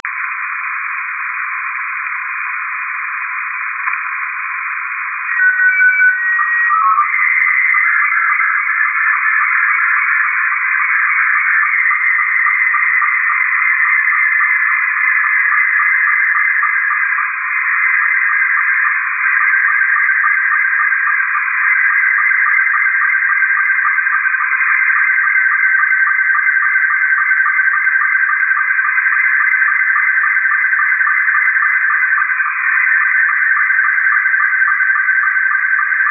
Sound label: SSTV